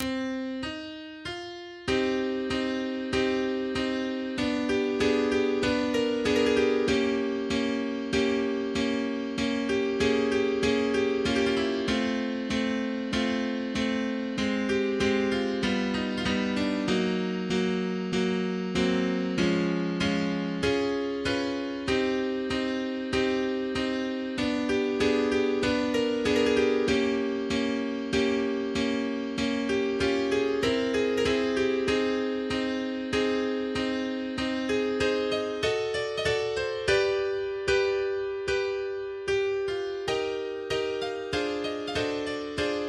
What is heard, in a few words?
Melody